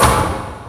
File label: kamikaze hit; Drunken Robot Pornography; Inago Rage